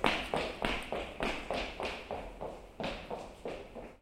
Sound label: running